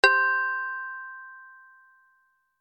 pluck C

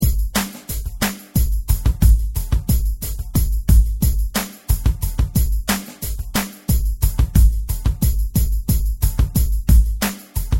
funk, rythm loops

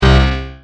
PPG bass

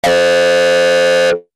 drive lead C

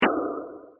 noiz synth, sfx drumkit

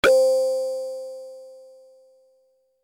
hard machine C, synths

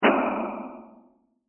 noiz synth, sfx drumkit

